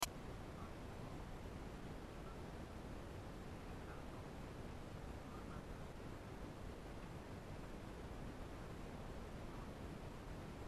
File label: case on sound